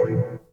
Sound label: sci-fi; AOS RF1 Kart Demo